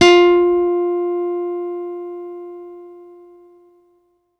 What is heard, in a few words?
guitar F, GUITAR STEEL; Best Service ProSamples vol.55 - Retro Sampler; AKAI